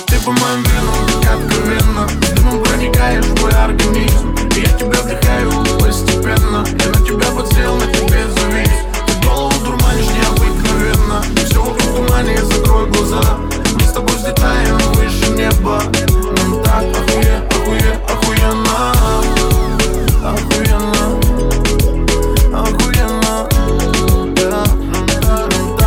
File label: Reggaeton Mix